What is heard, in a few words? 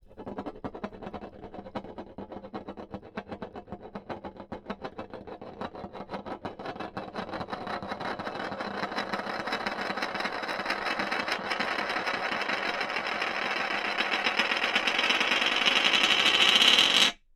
spinning, crescendo, glass surface, turned wooden figure, glazed, bright, slightly rough; tight; Eiravaein Works; Eulerian